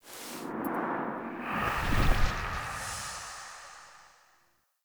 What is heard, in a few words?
Tent Night Fold Transition Exit; Soul Plane